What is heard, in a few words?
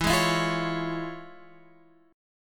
F Minor Major 7th Sharp 5th, E